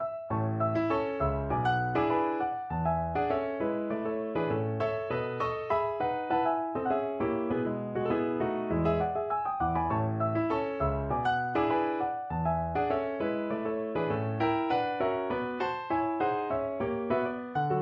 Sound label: piano